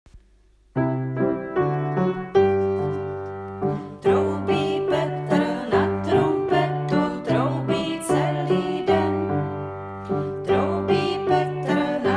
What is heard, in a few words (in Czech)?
trumpeta zpev; S Puntíkem a Pepinou za vílou Poupěnkou; jaro; barevné velikonoce